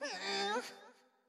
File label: Wimp Hover